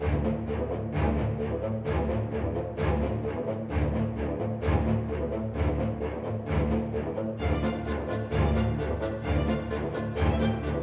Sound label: Action Loop; 25 Rpg Game Tracks